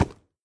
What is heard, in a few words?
wood, horse